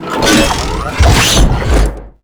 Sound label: biomech claw counter